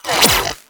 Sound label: Split Laser Retract; Warframe; Corpus